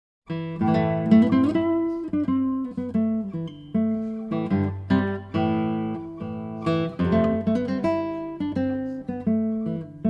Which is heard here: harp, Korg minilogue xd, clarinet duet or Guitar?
Guitar